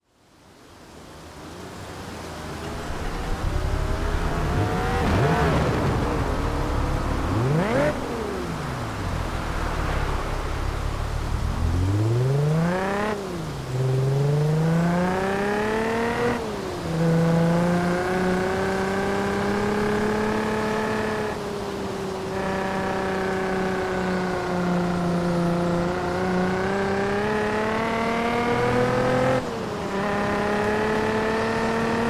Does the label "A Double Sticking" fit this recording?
No